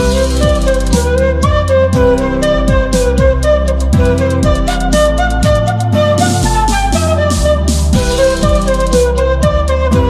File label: Flute Ringtone; Geetha Govindam Movie